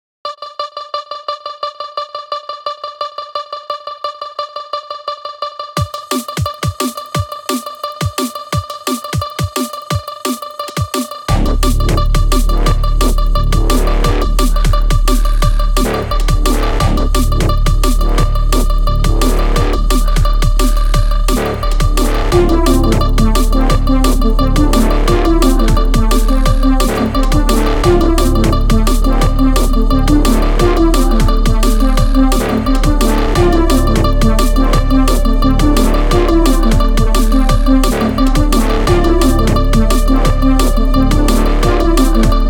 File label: Bubble, EDM Pop